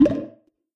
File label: spring; rabbit samurai 2